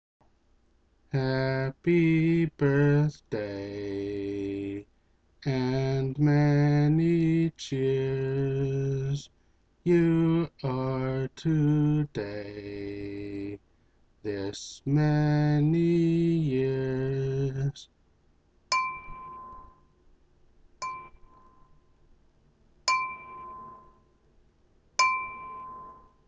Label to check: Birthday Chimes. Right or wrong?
Right